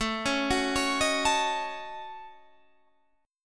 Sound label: D sharp Minor 7th Flat 5th A